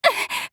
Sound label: gasp; maki